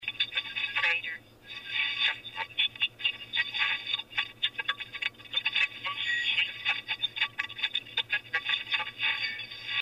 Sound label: major earthquake